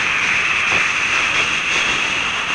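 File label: tv fuzz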